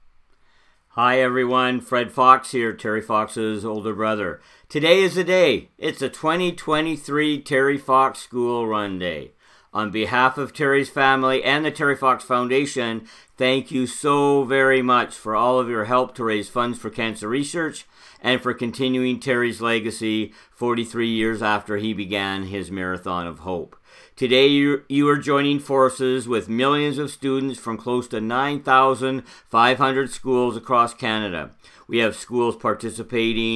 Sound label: cheer